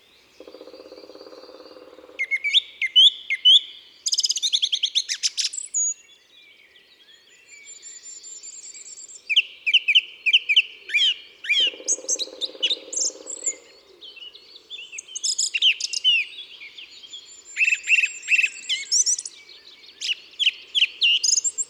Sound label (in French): Pic noir tambourinage; ABC des chants d'oiseaux